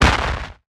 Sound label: asteroid damage large; Factorio